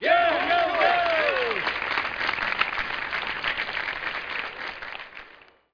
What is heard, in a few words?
crowd long shot